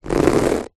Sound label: fingernails scrape scratch carpet; foley